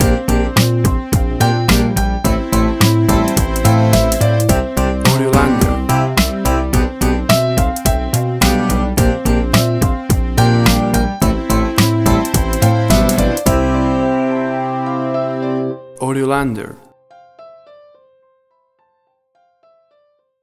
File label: 80s Cocktail Twist